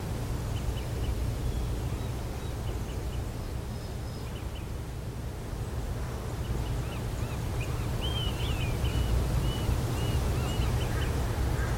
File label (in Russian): melodichnoe negromkoe penie ptic na fone usilivayushegosya dozhdya